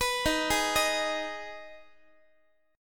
Eb Suspended 4th Sharp 5th Eb Guitar 4A 4E 5A 5D 5G sharp 6C sharp; Ebsus4#5; arpeggiated